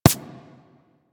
Laser Impact Generic; Surface

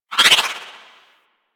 Dodge Roll Right, Vehicles; Movement; Warframe; Archwing

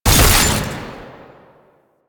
Alt Shot, Weapons; Warframe; Fulmin Prime

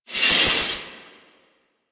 Spear Gun Whizzby; Weapons; Warframe; Tenno; Hoplite

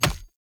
Grunt Death Default; Sinecure; Melee Grunt; Enemy; Death Effects

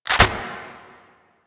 Sniper Reload; Kahl Sniper

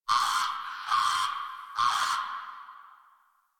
Tree Critters Scared; Warframe; Duviri

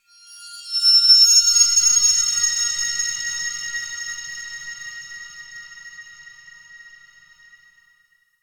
High Shing; Warframe; Ambience; Lore

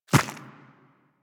Infested Small Walk Default, Footsteps; Warframe

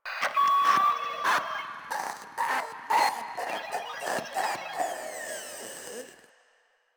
Proto Infested Runner Idle; Warframe

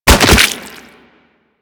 Death Fall, Bodyfalls; Warframe; Infested; Crawlers

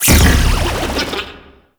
Nokko Mushroom Enemy Captured; Warframe; Nokko Colony; Mushroom Hunt

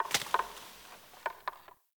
Day Branch Crack Small; Ambience; One Offs; Glades Of Andurin

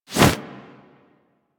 Arm Swing Foley Heavy; Enemies; Warframe; Purgatory Warrior

